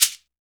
CABASA; Percussion Kit

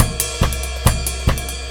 Jazzy Stomp 140 bpm, breaks; Zero-G Beneath the Planet of the Breaks

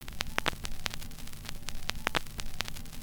Crackle Loop, Vinyl Noise

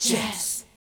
VOCALS; Ueberschall; Jam Box

